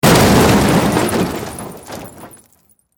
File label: Impact Crash Metal Car Drop; Materials